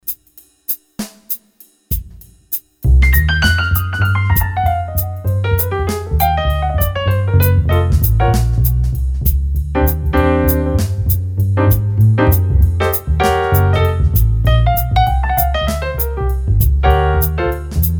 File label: Handmade Jazz